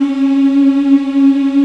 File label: C#4, AF.VOWELS; Spectrasonics; Heart of Africa 1